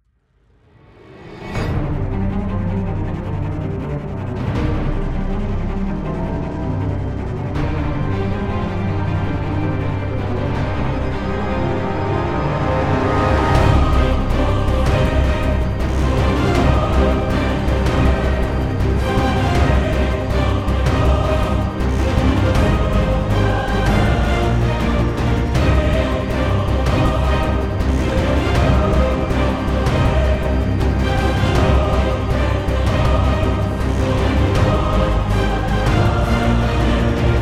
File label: Music Vamp Normal Battle; Bloodmasque